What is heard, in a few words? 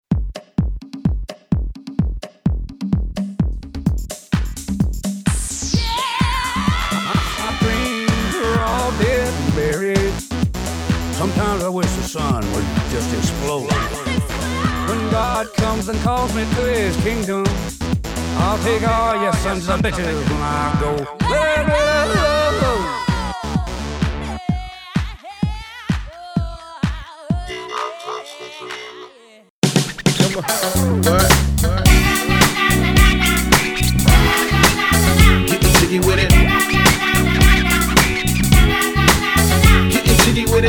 Singing Voiceovers